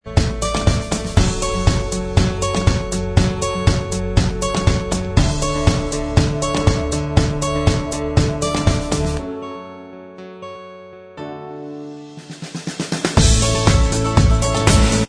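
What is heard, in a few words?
instrumental, track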